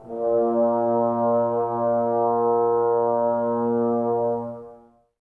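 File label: Brass trombones A#; Miroslav Vitous - Woodwind Brass Ensemble; Tbs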